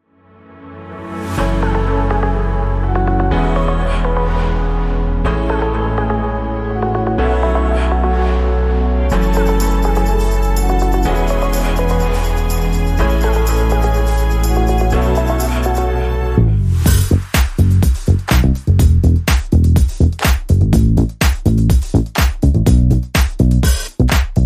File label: Electric Disco